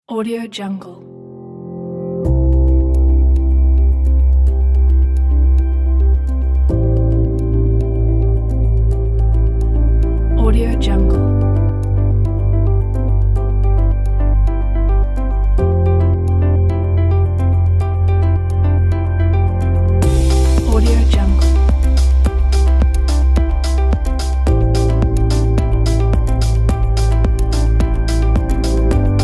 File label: tech innovation; brainalien